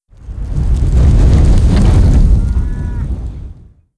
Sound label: stereo gust; ambient, ambience, rainscapes, rain; Left 4 Dead 2